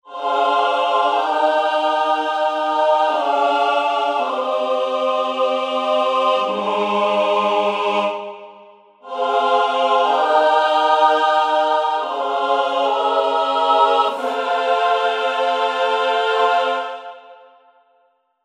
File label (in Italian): Coro